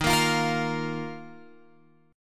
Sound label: D sharp Suspended 2nd, Guitar, Open F; strummed; JGuitar